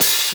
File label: HH, Dance Mega Drums; Best Service; HH HIP